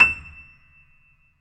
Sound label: BOSENDORF; E-MU Producer Series Vol. 2 – More Studio Essentials; Keyboards; Bosendorfer